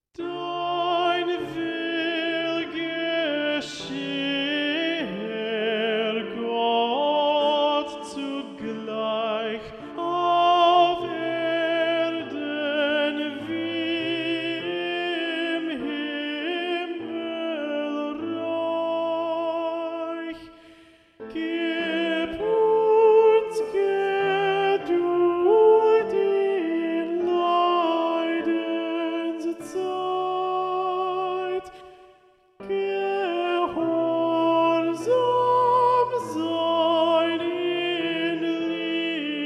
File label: Alto